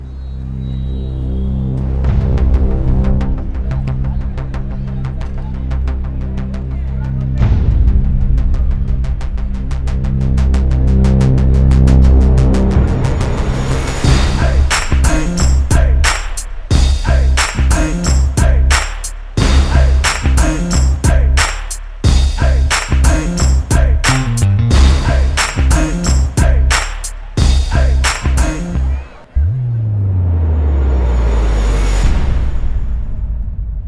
Fight BGM; Cricket Ground

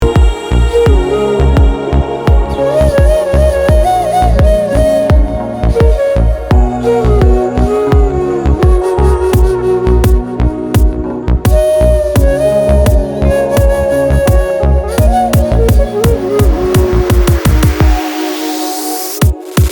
Flute BGM Ringtone; Avastha Web Series

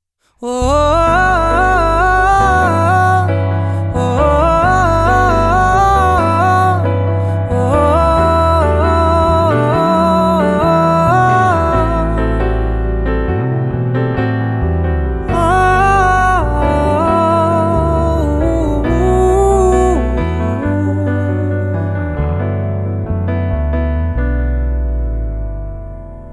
Humming; Andhagan

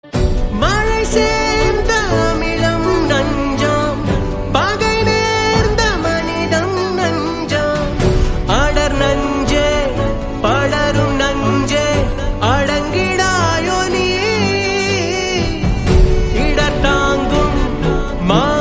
Ringtone

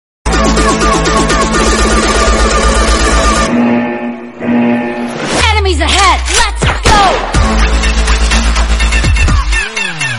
Remix Song Ringtone; Battlegrounds Mobile India

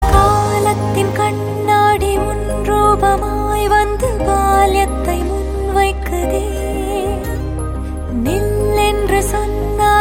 female

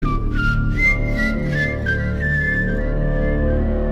Whistle BGM; Sinam

